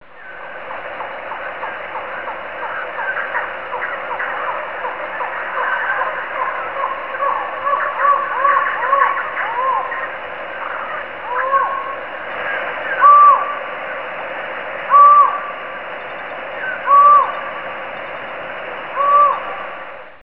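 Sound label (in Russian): воротничковый сокол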